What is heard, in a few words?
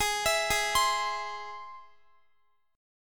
G sharp Augmented G sharp Guitar; arpeggiated; x,x,20,21,20,20